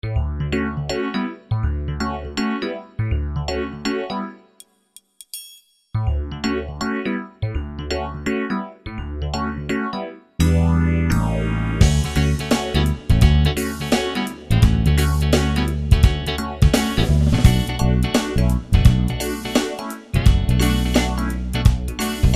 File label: with Phaser on Rhodes Piano; PHASER LITE on Rhodes Piano; El. Piano